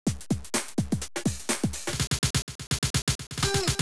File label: Music Loop; 300 games for mac; Arcade; MegaPong